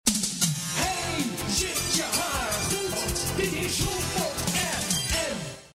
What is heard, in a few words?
Jingle; Bloempot Fm; He zit je haar goed